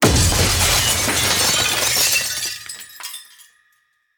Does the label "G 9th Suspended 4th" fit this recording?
No